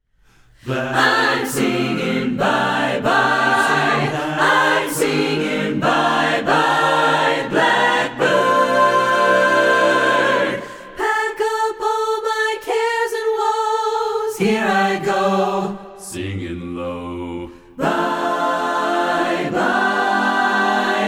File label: satb